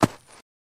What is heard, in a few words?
Horse Hoof Thud